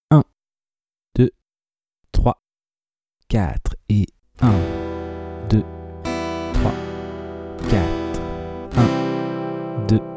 lent travailler la nouvelle grille avec la deuxième rythmique; De 0 à vos premières suites d'accords

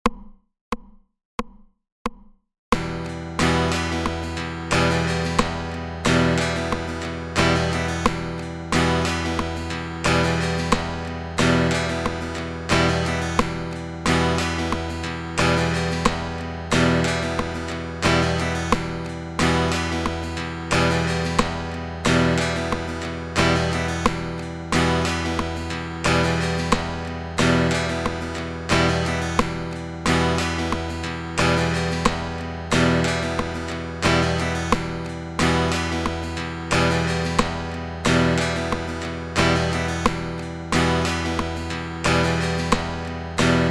Accents sur rythmique rapide 90

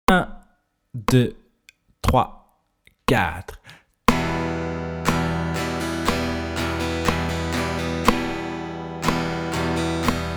Rythme rapide bas et haut 60

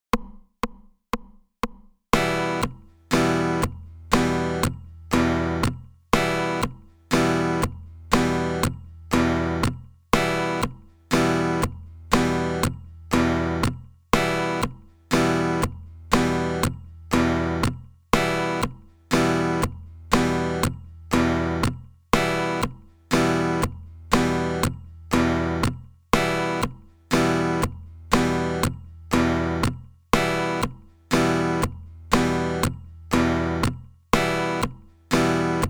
Percussions sur 2 et 4 120

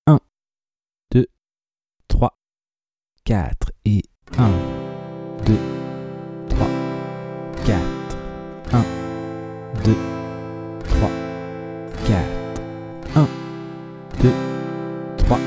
lent travailler la nouvelle grille avec la première rythmique; De 0 à vos premières suites d'accords

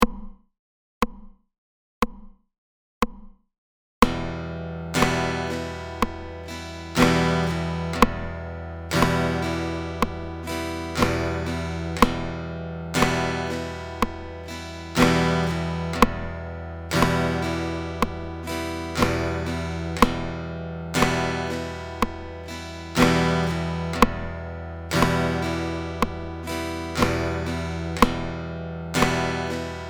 Accents sur rythmique classique 60

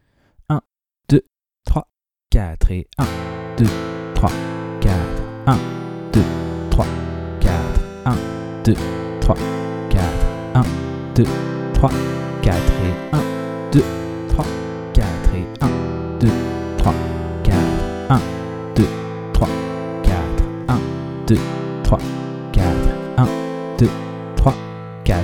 rapide travailler la rythmique avec la grille; De 0 à vos premières suites d'accords

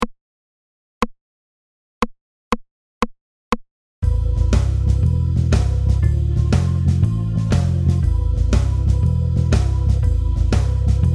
backing mineur, 120

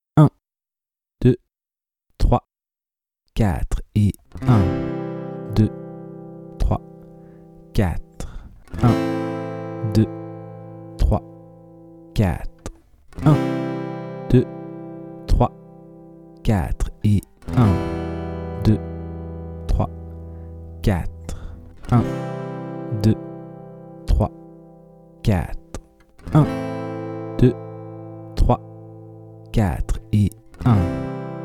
1 lent Travailler la nouvelle grille d'accords; Enchainer les accords en boucle, en les plaquant sur le 1. Profiter des autres temps pour bouger les doigts de la main.

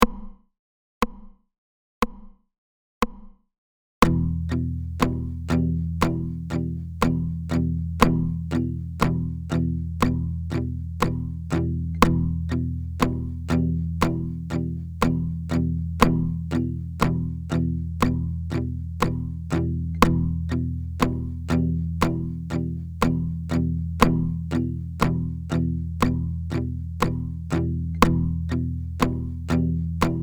Rythmique rock 60

